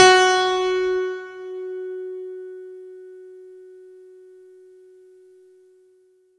F#3, synth; 90 s Sample CDs; NiceBeats; SynthLine VOL-26

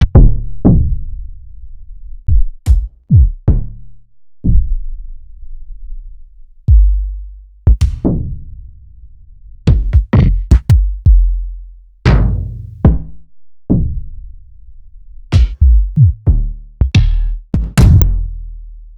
Kicks

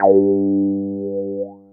GUI MULT; EastWest; Dance Mega Hip Hop Electro

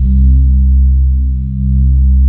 MUTE F PADS; Pro-Rec - Synthsonic

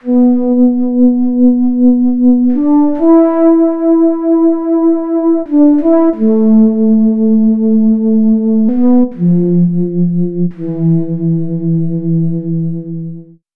Synth Flute; Sonic Foundry (Sony Creative Software); Slow Silhouette

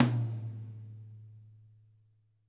TOM, WARM+FAT KIT

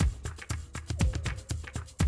120, Classic Drum Loops

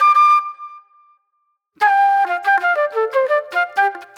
Disco Flute

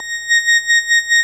ORG Vox A#, Continental Vox Wah Bar; Roland LCDP09 Keys of the 60s and 70s 1

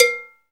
Agogo Lo, Percussion Kit

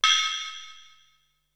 Cluster Loop, Ensoniq SQ-R Plus

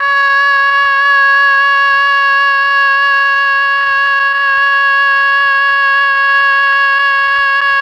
OBOE; ILIO; Propeller Island Legendary M400

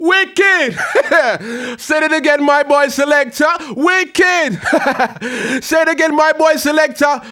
HAL RAGGAM, RAGGA-MUFF A maj

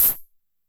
HAND CLAP, 909 Filter

